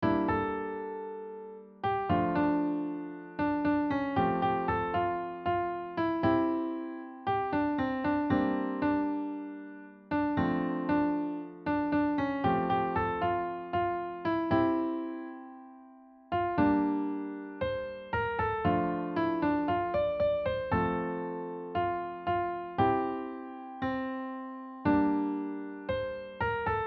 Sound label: Midi